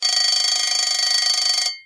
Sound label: alarm Clock; ClassroomTimer